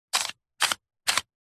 leisure game plastic panel squares slide solving puzzle; rubiks race